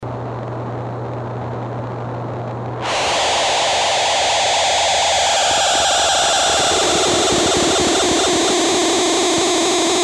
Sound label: delay dist